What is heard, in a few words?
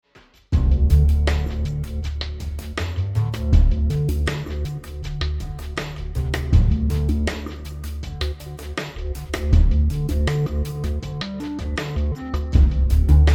Ambient inspiration